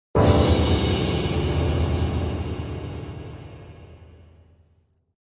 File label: Close Quarters Combat Sting; Call of Duty 3; PS2; PS3; Wii; Xbox; Xbox 360